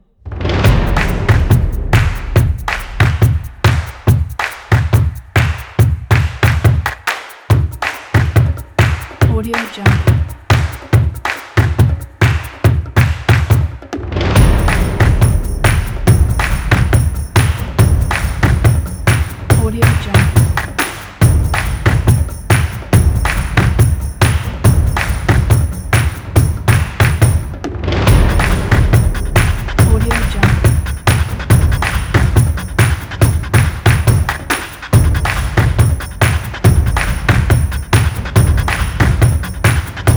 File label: stomp clap